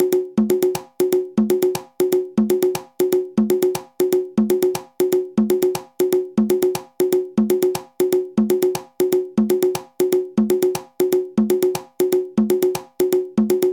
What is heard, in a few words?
makuta cachimbo